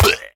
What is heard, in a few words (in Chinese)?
死亡声; 唤魔者